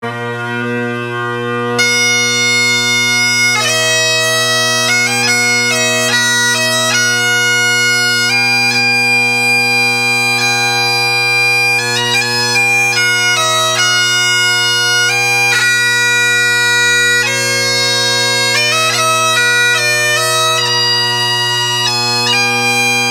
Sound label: piper